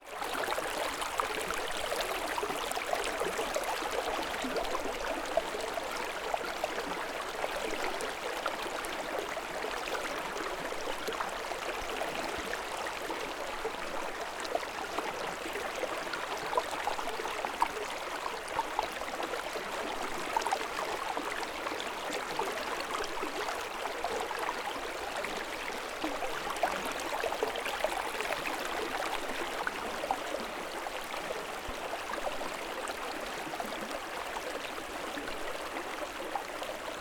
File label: River Flow